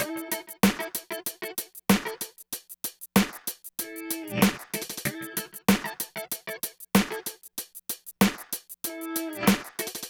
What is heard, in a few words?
Guitar drums, 095 Emin, Hip Hop